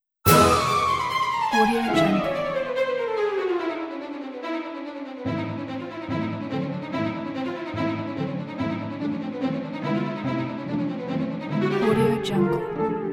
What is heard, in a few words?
flight of the bumble bee rimskykorsakov